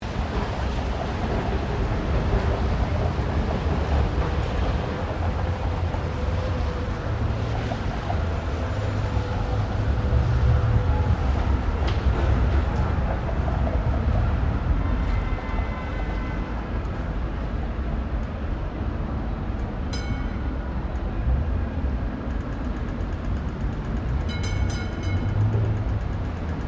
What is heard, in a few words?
Ambience Melbourne City Night Loop, General City Ambience; Cities